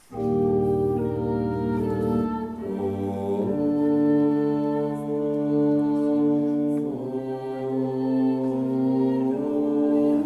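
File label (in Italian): Inno Al Signore Della Tempesta, bassi finale strofa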